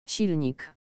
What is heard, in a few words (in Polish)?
silnik